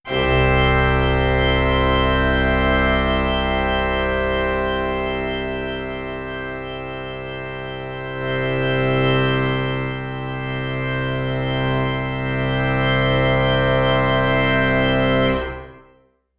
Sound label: Chord Version